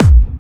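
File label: BD Clubby, bass drum; VEC1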